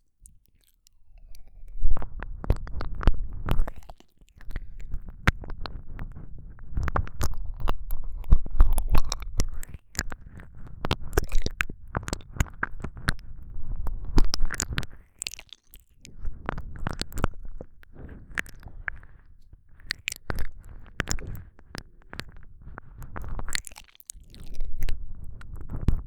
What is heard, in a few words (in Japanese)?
ぐりぐり舌を押し付ける耳舐め; 【超耳舐め特化】舌の感触を楽しむ耳舐め【安眠ASMR】